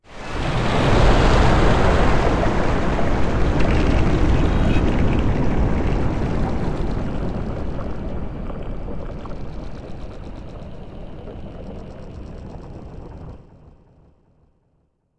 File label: magic ambience ice; sonniss gdc